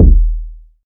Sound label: hot bass drum single hit d sharp key; kick drum